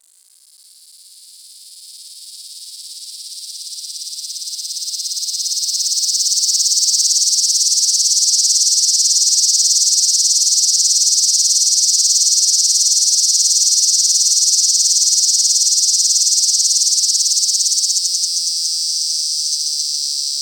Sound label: Megatibicen harenosus, cicadas songs; East of the 100th Meridian